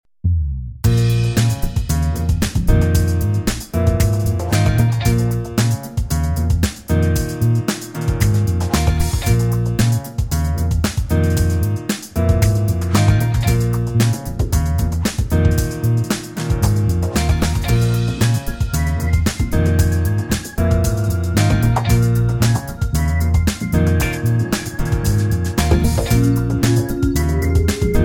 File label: ambient electro; cbstudioproductions; musique pub; publicité